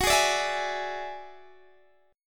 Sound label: Gb 7th Suspended 2nd Sharp 5th, Guitar, Open D